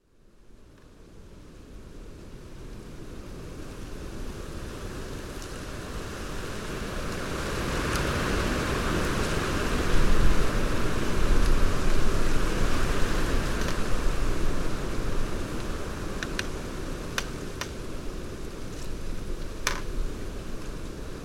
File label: wind